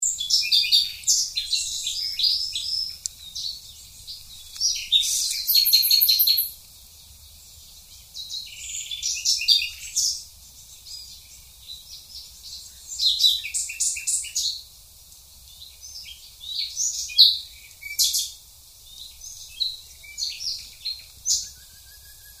Kanarienvoegel

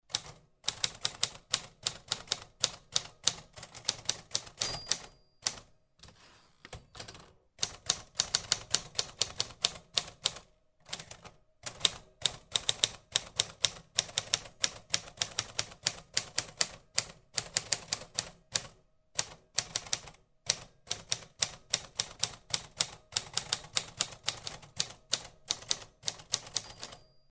Schreibmaschine

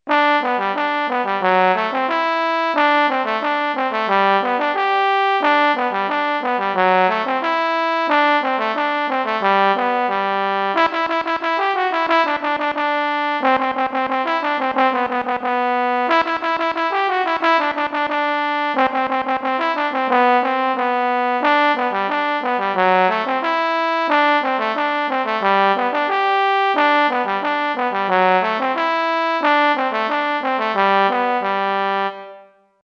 bayrisch polka

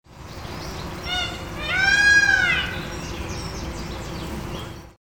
Pfau

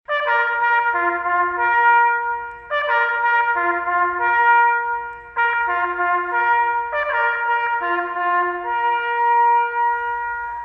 Jagdhorn

